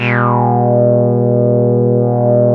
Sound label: TAURUS C3, synthesizer; Hollywood Edge - Giorgio Moroder Rare Synthesizer Collection